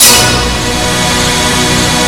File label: FINALE HIT; Kid Nepro - Elite